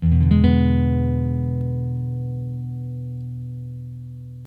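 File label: classical chord, guitar; Greytsounds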